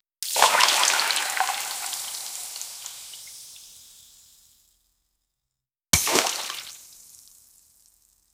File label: wet sauce splash flood